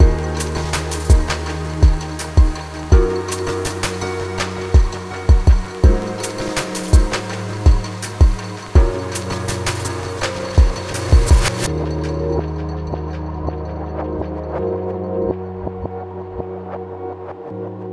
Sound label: rain ambient